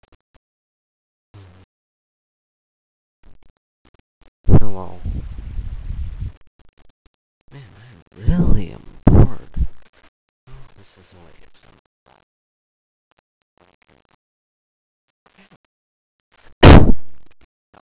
cough

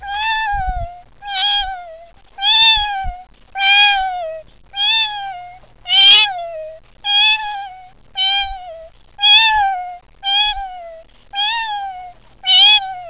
chipsong

